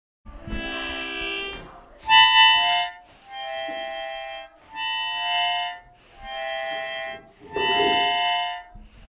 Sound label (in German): mundharmonika